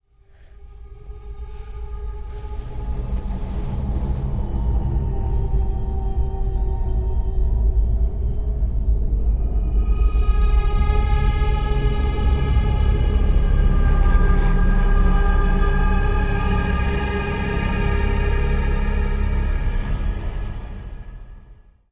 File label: amb; spawngirlsounds